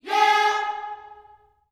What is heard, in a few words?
"yeah", gospel vocal, G#4; Spectrasonics; Vocal Planet Vol.1 Gospel; akai